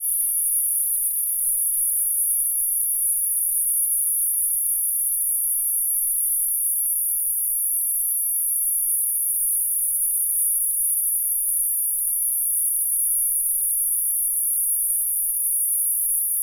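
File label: animals insects crickets australia qld night; zapsplat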